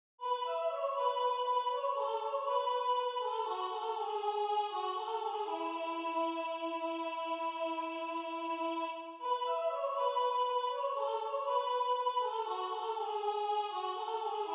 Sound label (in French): la belle ha c la ne soprano